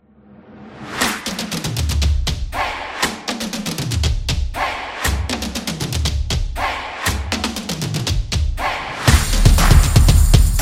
A Drum Beat

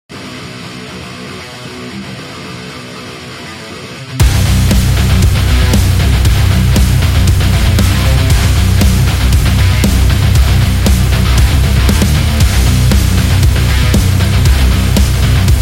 Hardcore

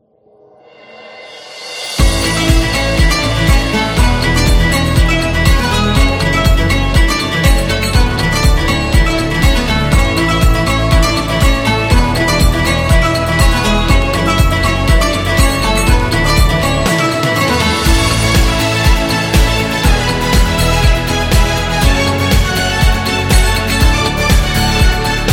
Bright India; sounds of the world

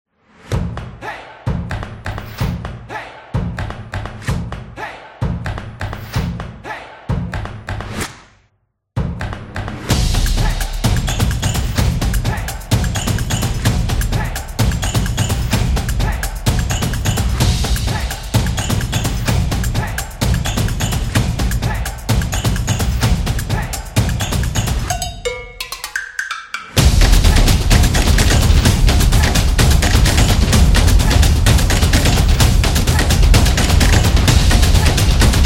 Drums Action Beat; percussive nation vol 6

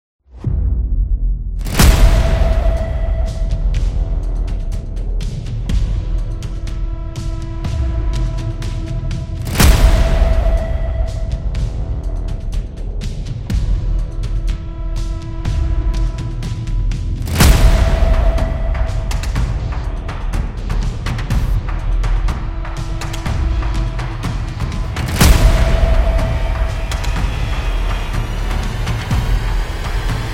War Drums

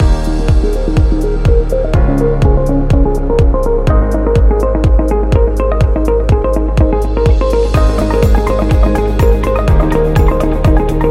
Minimal Loop

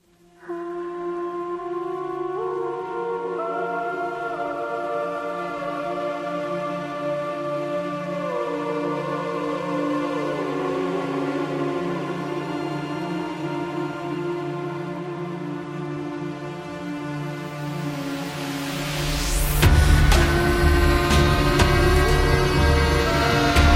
Scare

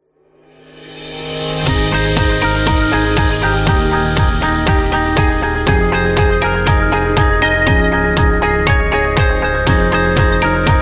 Product Commercial; business background

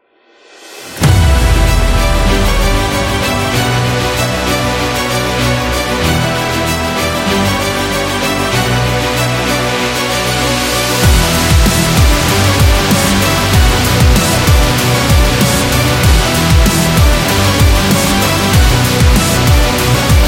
Dramatic Sport, electro rock

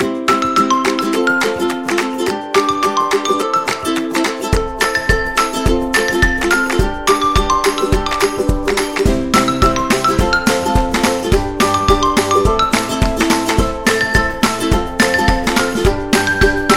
Summer Folk, happy music

